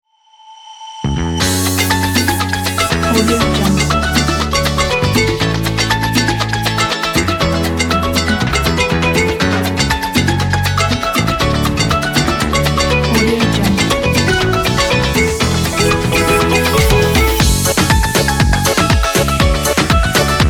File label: inspiring summer travel igniting